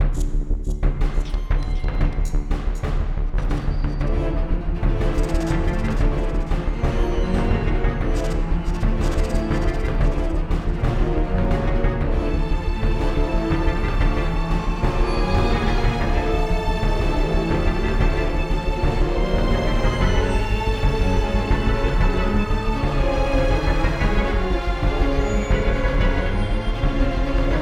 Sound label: Fight music